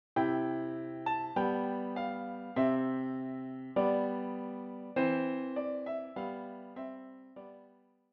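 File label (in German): Alle Jahre wieder vierhändig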